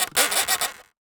Polystyrene Creak

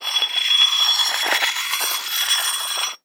Hole Saw Scrape; Hole Saw Bits

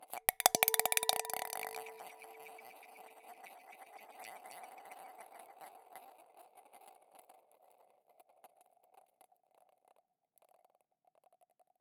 Ping Pong Rattle, Large Wine Glass

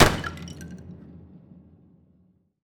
Key Smash, Piano Destruction

